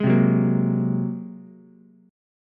A sharp 7th Suspended 2nd Sharp 5th, down, Ukulele; strummed; chord; JGuitar